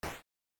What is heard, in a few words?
click sample; PPdastr Studio